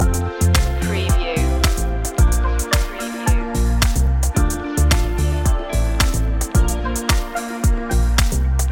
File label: summer fashion house loop